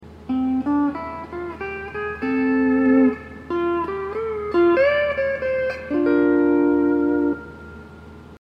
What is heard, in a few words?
hawaiian piece